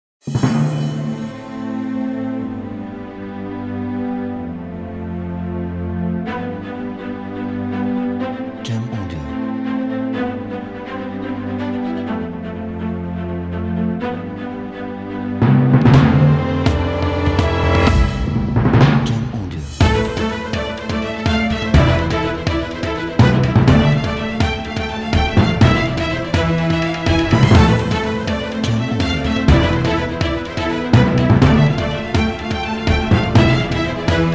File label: Cinematic Trailer